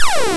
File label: FX; E-MU Formula 4000 Series Vol. 3 – Analog Odyssey; VK+ Instruments